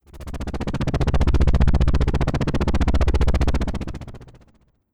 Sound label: F L Y; Aerobot